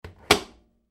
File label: household washing machine door close; Appliances; McKinney Sound